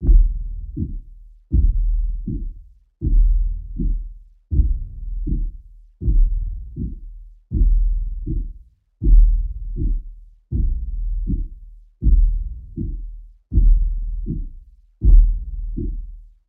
Suspense, Loop, 40bpm, Classic Horror Sci-Fi Music Pack; Dead Ark